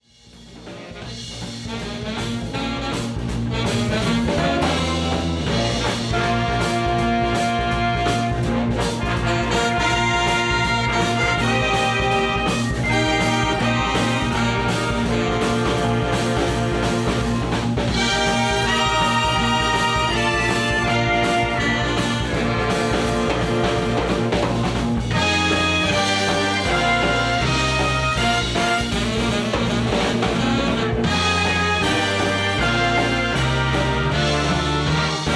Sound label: big band